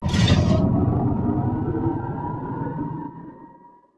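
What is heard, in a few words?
generator power increase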